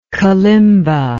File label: kalimba